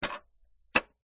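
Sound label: CD Player Open Close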